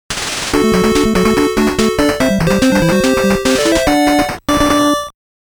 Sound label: All Stage Clear